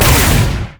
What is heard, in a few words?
crit attack; lucian skin01